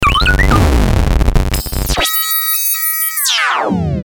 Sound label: Stinger Data Distortion, Telephony Stinger Bass Drop; Production Element Imaging Element Accent Transition